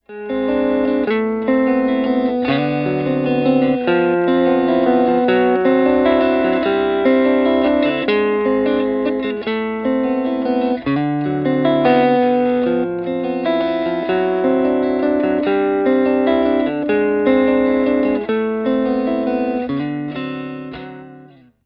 TELE NECK WARM DRIVE OVERDRIVE LICK, t neck pickup